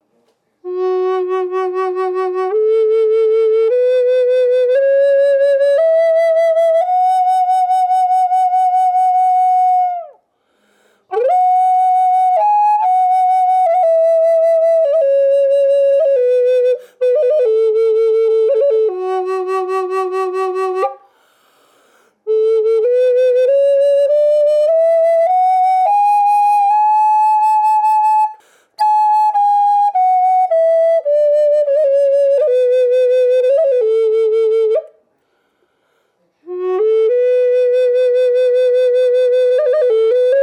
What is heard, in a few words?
native american flute cocobolo fsharp minor